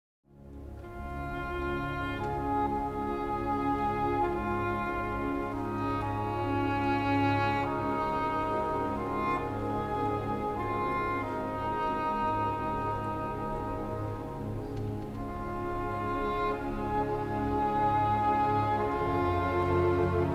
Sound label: English Horn; Dvorak 2nd Movemen